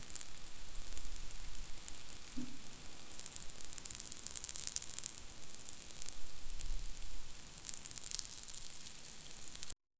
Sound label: soft dry earth crumbling